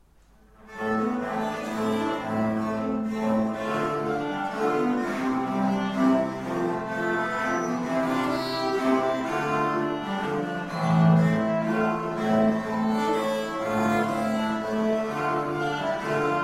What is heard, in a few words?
trio